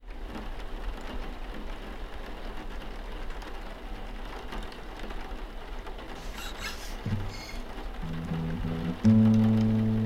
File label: Soundscape; Pearses